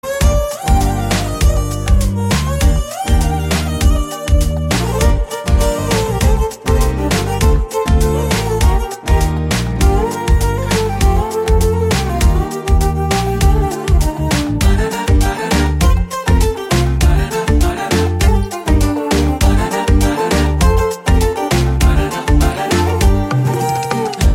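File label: Love background music Tone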